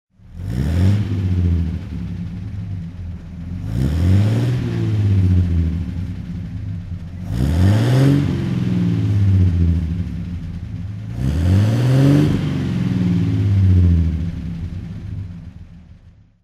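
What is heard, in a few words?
sunbeam 20hp 1927 throttle, engine sound; Sunbeam 20hp 3-Litre tourer; V3D/ ClassicarImages; Marc Vorgers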